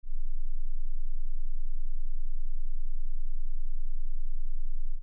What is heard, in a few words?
5 sec silence